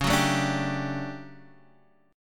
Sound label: D Major 11th Db, chord